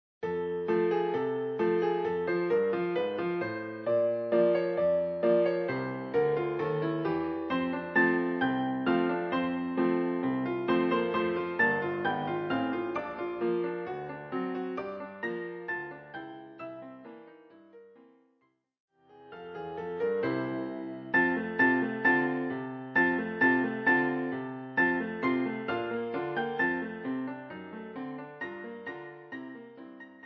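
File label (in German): Jingle Bells Klavier vierhändig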